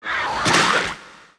mushroom die

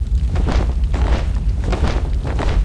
walk; FFocalor; Seer; GamigoNA

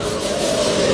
Wind Worker walk

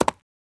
walk; G Master

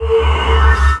Shining Purge; Gamigo

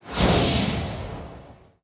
Light Jump; Seer; GamigoNA

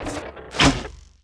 Skel Archer Atk

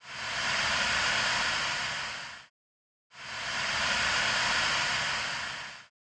Steam

